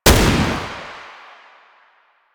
Gunbrella Shot, Weapons; Warframe; Tenno; Koumei

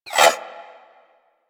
Laser Whizzby; Warframe; Weapons

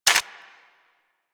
Shotgun Spin Fire; DE; Warframe; Weapons; Skins; Zoboz Shotgun Skin

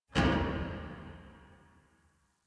Parking Lot Metal Door Distant

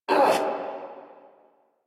Pain Lich A

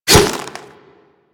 Swipe; Warframe; Infested; Charger; Attacks

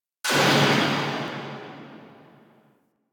Air Pistol Tail; Warframe; Corpus

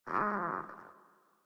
Kitten Snore; Warframe; Catbrow

